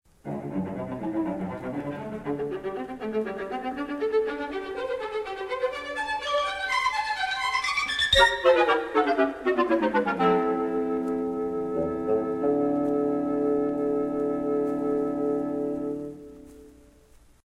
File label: Light Mechanical, Cartoon Music; Cartoon Music Discs